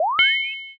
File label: draw sword